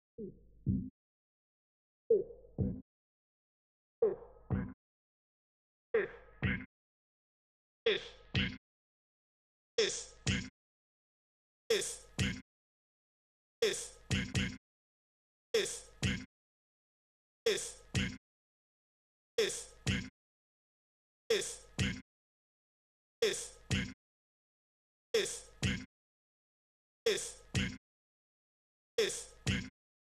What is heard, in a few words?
Vocal Stab Wet G min 125bpm